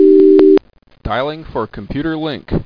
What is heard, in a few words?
DIAL4DAT; VBDIAL2